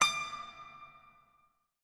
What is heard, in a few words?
Tech, Weird Side Samples